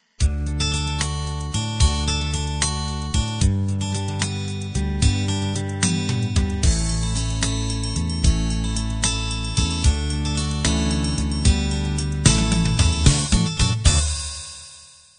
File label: celtic ballad